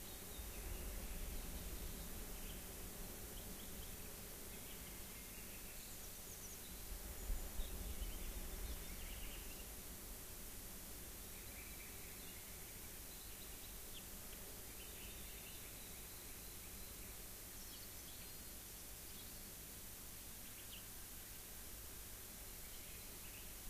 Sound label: rayol farm; SEAMED